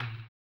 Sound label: HAMBONE; Blues & Soul